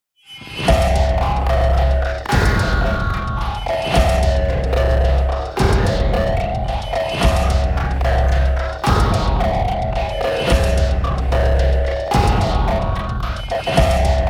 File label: Waltz With Vampires (Percussion); Fired Earth Music